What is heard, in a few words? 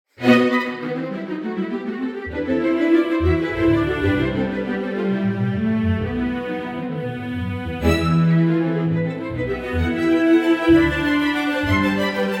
strings; Arensky Var II; Musiversal